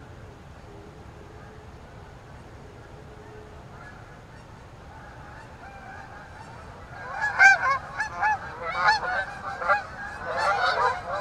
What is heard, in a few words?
canadian geese flyover; locustream